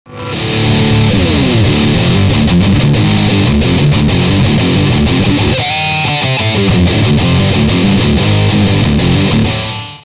Digitech Death Metal, effector

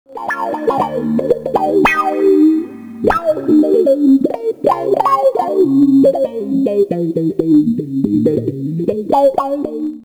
boss aw3, guitar effector; guitar effector variation

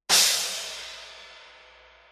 crash, Loud Hit, crash16; sabian hhx evolution ozone

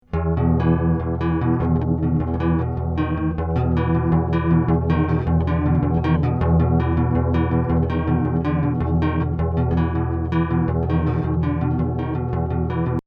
Chorus, effector bass

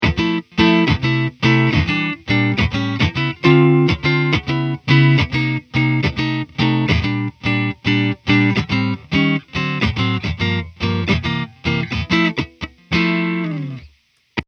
Full attenuation Jazz, Maverick; eminence; Jazzy rhythm - full FDM attenuation; Tomo Fujita

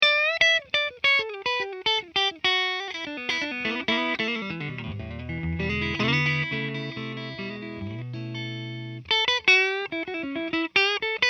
Country 2, full attenuation; eminence; Country riff 2 - full FDM attenuation; Guthrie Trapp; Maverick

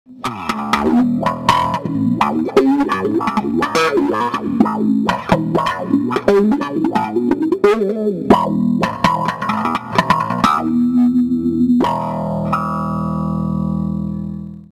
rocktron rt3400 bass, wah

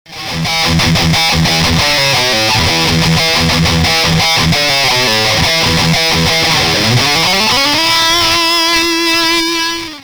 Boss MT2, effector

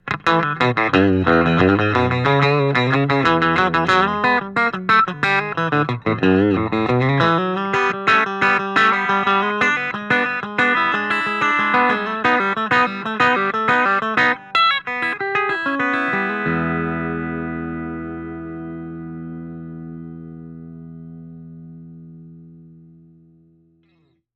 Raunchy Tele; eminence 820h; Billy Penn

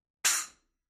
HHX Evolution Splash; sabian splash7 brilliant finish; Choke

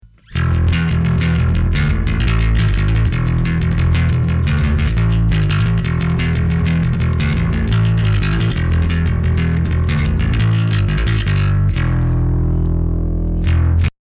Bass Driver, effector bass

